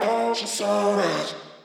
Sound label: phrase Ebm, Vocal; Slate Digital Omnivox Vocal Sample Pack